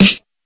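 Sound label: classic hip hop snare; STYLE KITS; Classic Hip Hop Mega Kit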